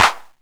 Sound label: Bass Clap, Jomox Airbase99